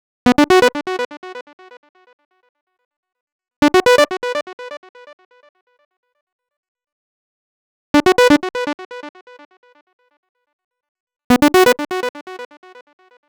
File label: 125 Bm Synths, Complex Electro Synths; Prime Loops; Precinct13